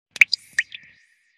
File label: Notification Ringtone; Vivo S12 Pro